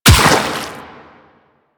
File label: Run Water, Footsteps